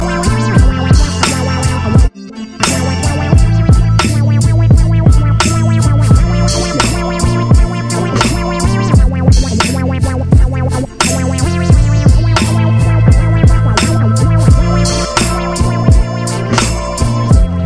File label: hip hop tone